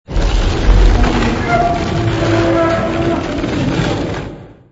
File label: rusty door; ambience